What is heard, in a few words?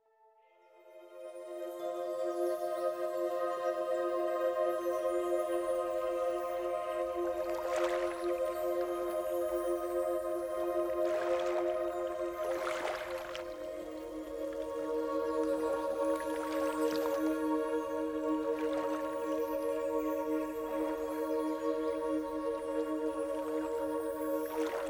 ocean waves; healing ocean waves zone; energy from nature